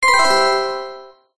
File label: multimedia alert chime bell bright positive; zapsplat